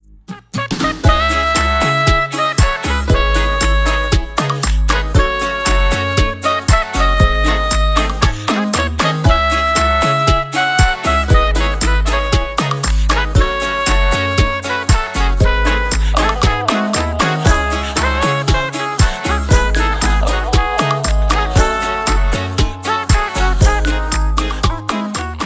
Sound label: Instrumental